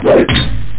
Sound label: Big Swoosh On Metal; Chaos; ChaosHeavy